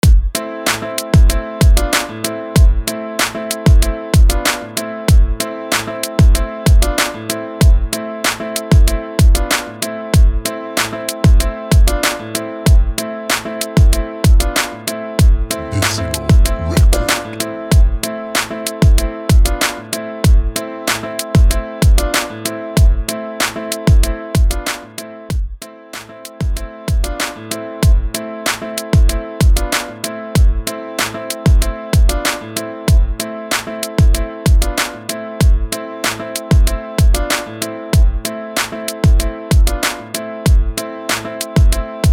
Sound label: West coast Gangsta Rap